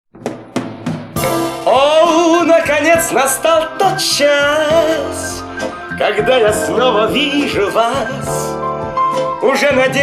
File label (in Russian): Дуэт Пса и Болонки; pyos v sapogah